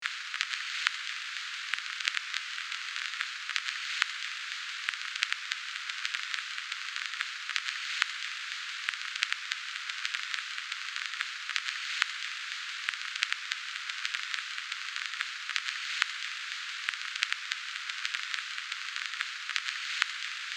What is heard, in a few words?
Vinyl crackle noise vintage loop; vinyl and record player; gfxsounds